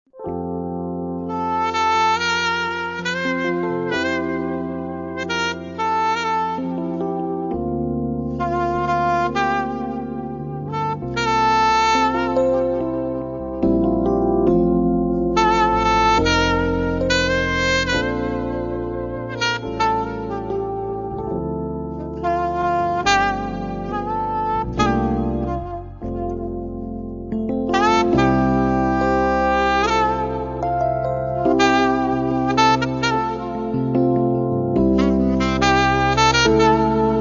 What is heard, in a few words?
quiet jazz